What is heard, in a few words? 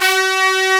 Brass C3